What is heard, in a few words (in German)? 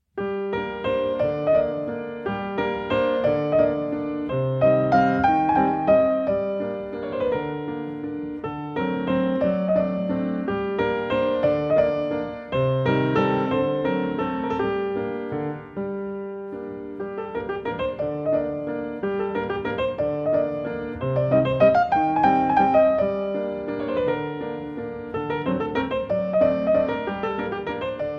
Valse g-Moll